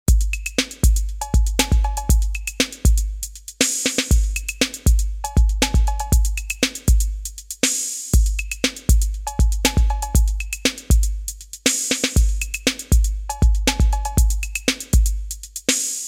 rap drums

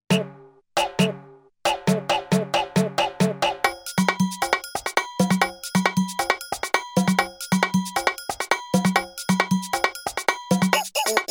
song

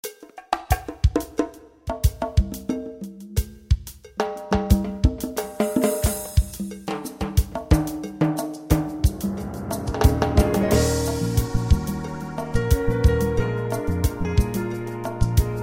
Kurzweil ME-1; FANDANGO

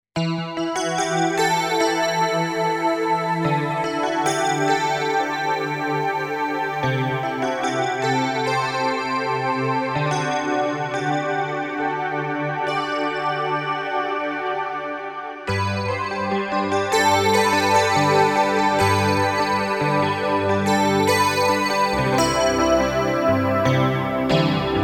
akai 2stack

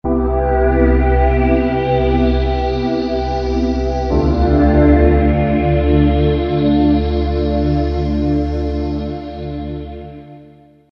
Ambient pad

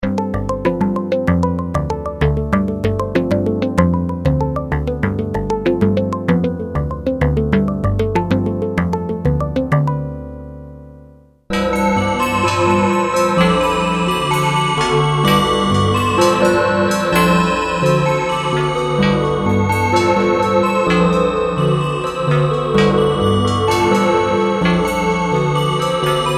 kawai k1 decay digital

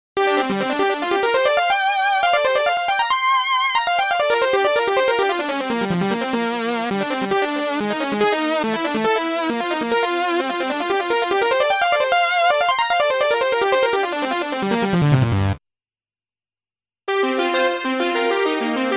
lead

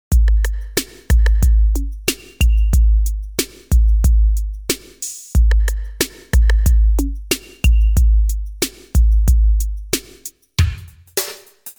beats, ts10

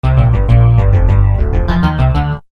Voice bass

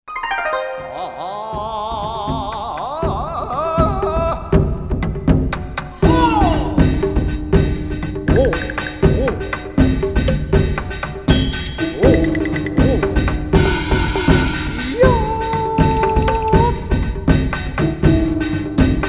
asia drums

